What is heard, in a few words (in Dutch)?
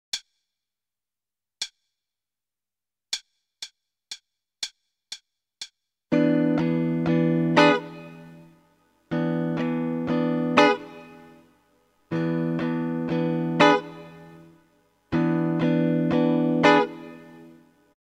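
Ritme, gitaarstarter; Meespeel CD; Slagritme Schema 12; Slaggitaar 2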